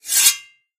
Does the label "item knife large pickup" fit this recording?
Yes